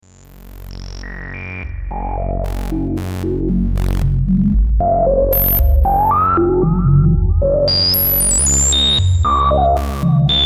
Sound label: s-h filter